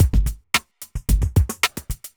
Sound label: evil beats 110